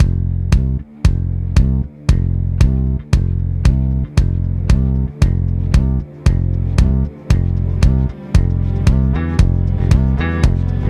music banter loop; Necrobarista Final Pour

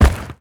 Fishmen Footsteps Dirt; we are the dwarves

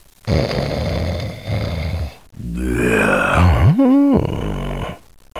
Snore

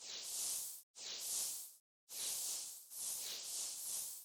Spa Heal Loop

